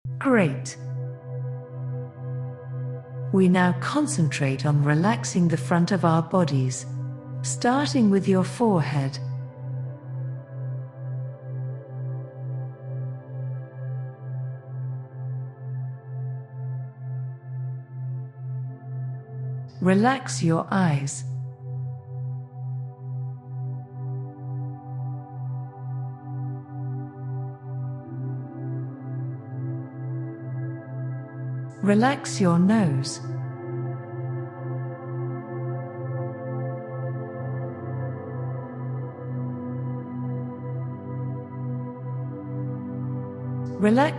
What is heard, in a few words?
Sleep Body Relaxtion in C